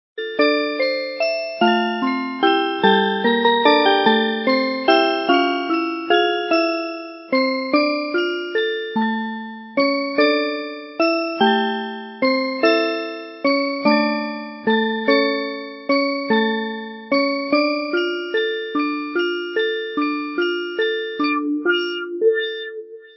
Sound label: Music Box